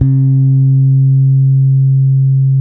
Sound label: SOUL, Bass, D 4; Spectrasonics; Bass Legends